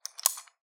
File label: gun check; soundscrate